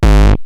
Roland TR-909 BD; BD Selection

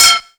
VEE Percussion; Percussion Instruments

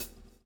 swing

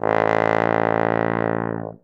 BARITON; Best Service; Alpine Volksmusik

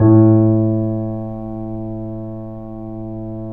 A, The Piano; InVision Interactive - The Piano; BOESINGSOFT

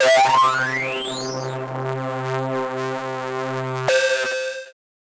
Synth Hits, C3; Zero-G; Soundclash Nu Dance Electro; Acidized

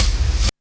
REV KICK

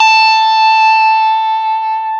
GTR Tube, Dirty; Club-50 - Foundations Roland

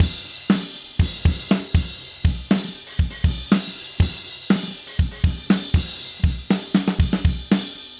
Acoustic Kit, Drums & Percussion; Electronic Point Blank

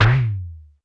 Roland U220 Electric Set, Drum Machines

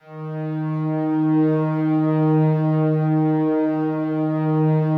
SLOW STRINGS 2 D#2 L, Yamaha VP-1; 90 s Sample CDs; Music Store

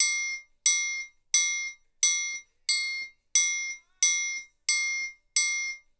LOOP, Percussion

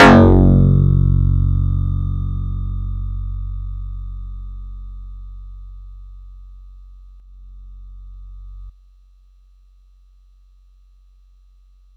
Yamaha YX81Z Elec Bass f#2

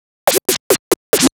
Scratch Fills 140BPM

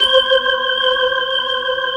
C5, Keyboards Old School; USB Soundscan vol.09; AKAI; BIG HAMM

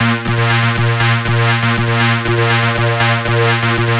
Techno Synth

